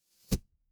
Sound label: impact bow